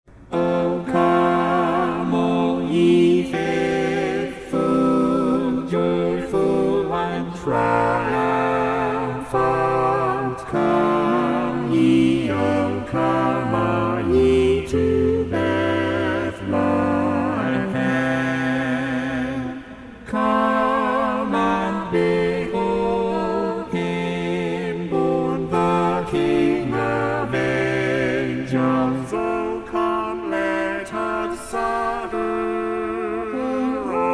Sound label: vocaloid; O Come All ye Faithful